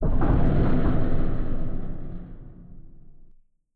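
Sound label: Collision Interior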